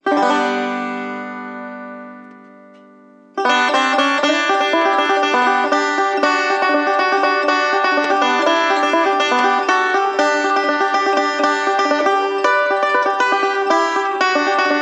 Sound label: Banjo Happy Birthday